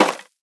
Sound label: cardboard pizza box impact; physics